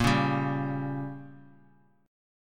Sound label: Bb Minor 6th, guitar; strummed; JGuitar